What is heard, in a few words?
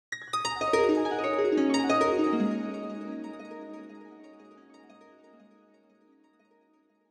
Dream Harp